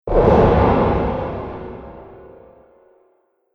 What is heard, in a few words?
Fantasy Explosive Impact; Miscellaneous Impact; Andrew Fleming